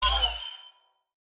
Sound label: Phase Plant, bass